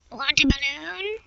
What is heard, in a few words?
balloon